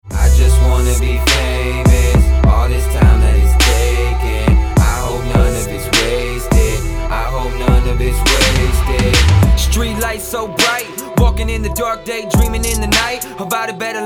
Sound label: HIP HOP SAMPLE